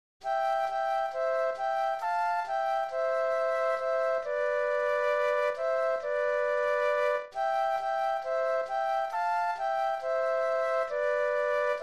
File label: Flute Duet